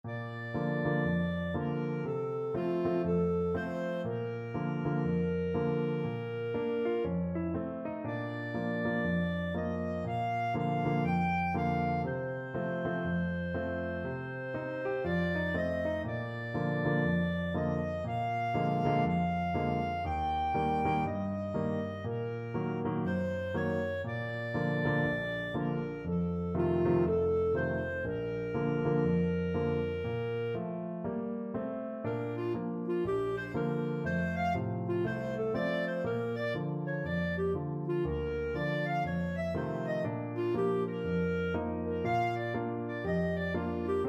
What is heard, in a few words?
careless love, clarinet